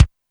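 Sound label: kick Spiked, Alesis D4 drum machine; 300 Drum Machines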